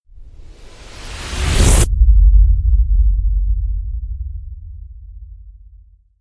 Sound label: rise to hit deep water